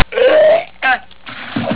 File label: vomit